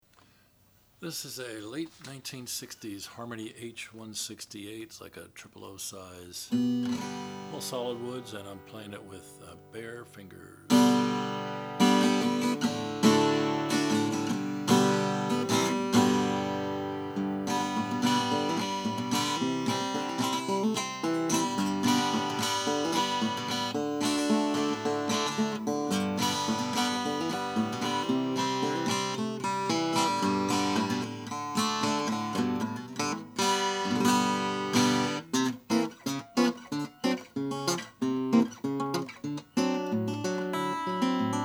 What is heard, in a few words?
harmony h168 natural, Guitars Acoustic Vintage Blues; acoustic guitar is a triple-0 size